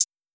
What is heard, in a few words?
Hi-Hat, Closed Hats